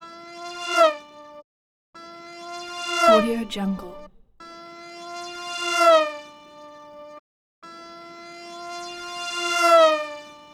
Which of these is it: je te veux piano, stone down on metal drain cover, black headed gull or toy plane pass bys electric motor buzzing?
toy plane pass bys electric motor buzzing